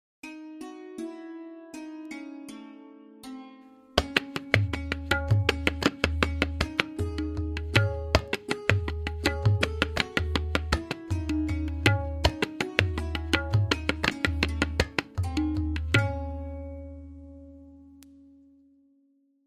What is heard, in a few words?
Tihai Lehra; Bedam